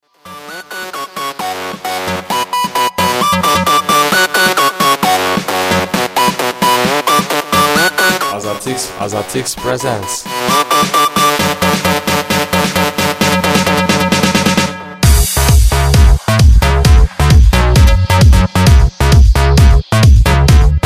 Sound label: turkmen bounce bass remix